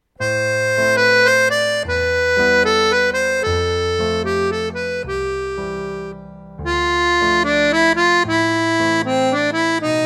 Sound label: Instrumental